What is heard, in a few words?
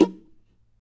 NOISE, guitar